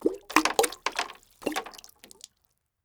Liquid Water Marble Dropping Into Water In Plastic Tub